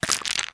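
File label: Liquorice Lock Broken; Candy Crush Jelly Saga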